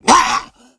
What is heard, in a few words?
Goblin hurt; SFXEnemies; Dungeon Defender; DunDef